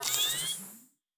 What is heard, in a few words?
Device Start, Electric; Sci-Fi Sounds